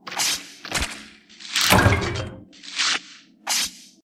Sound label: Carve